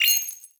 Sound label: get money